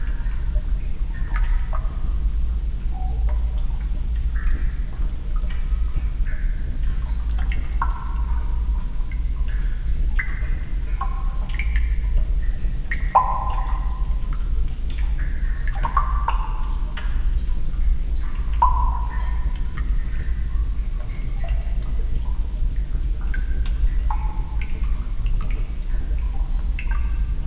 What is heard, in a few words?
drips